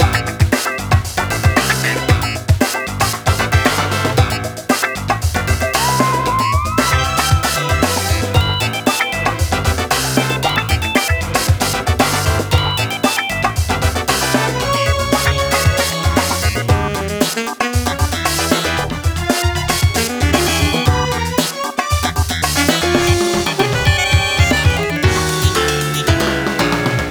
BOSS fast LOOP; Deathwish Enforcers

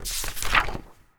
Pop Quiz Page

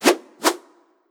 Skill Ninja Mooves

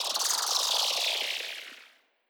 laser; Legend of Keepers (gamerip) (2019)

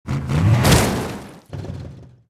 Nomal Attack; starbites

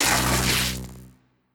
Skel Drake Elec Start

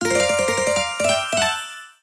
Sfx Tennis

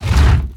Monster Generic Foley Movement Slow; Rampage

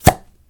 Arrowstorm; rabbids multiverse; iOS, macOS, Mobile

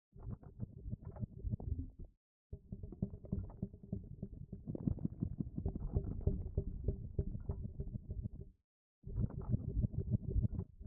Purple Rumble

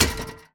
Physics-Object Saw Impact

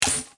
ui generic error

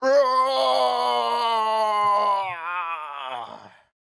Big Death

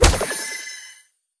Skill Hit; In Characters Skill Hit

